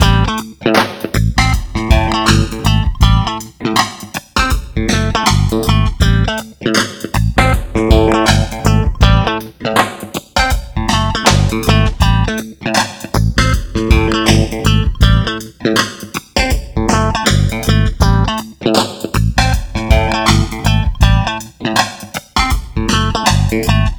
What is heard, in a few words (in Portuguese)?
Base Funk A Maior